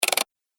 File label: Pc Mouse Wheel; High Tech Sound Effects